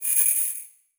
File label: Digital; Adobe; Multimedia Internet CD-Rom Flash